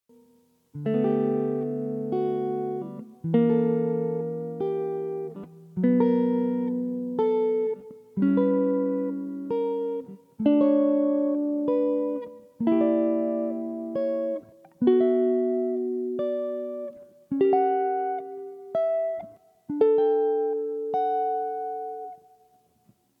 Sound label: Maj 6th and Dim Chords borrowing on 1st, jazz chords; Barry Harris harmonization